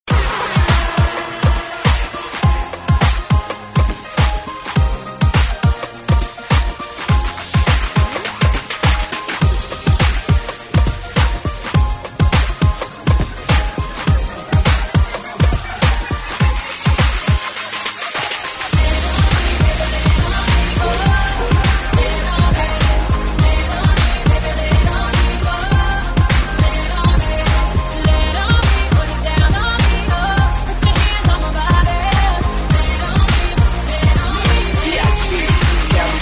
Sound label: funk; multitrax